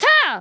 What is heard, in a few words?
kiai; MonsterHunter; Fight